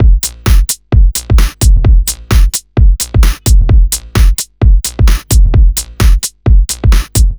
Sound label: Fidget, 130 BPM breaks loop; loops; Loopmasters; Jon Carter & Alex Blanco; Block Party Essentials